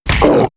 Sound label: Grunt Hit Light; netent